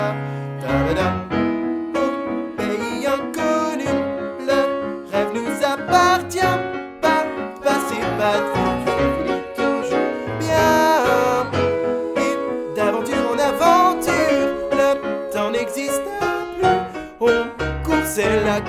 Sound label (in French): Tenor